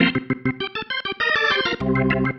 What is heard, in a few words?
Single Riff, Organ; Sonic Foundry (Sony Creative Software); Joe Vitale Organ Donor